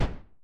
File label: EXPLOSION Subtle Bang; adams venture origins